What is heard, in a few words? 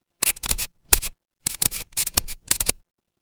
office pen